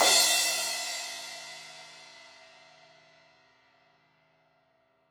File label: Crash 16in edge, Cymbals